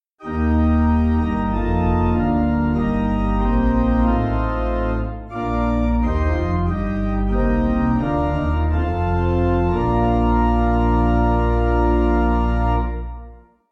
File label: Pipe